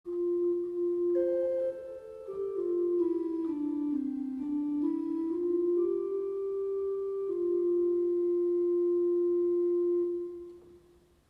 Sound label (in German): Gedackt 8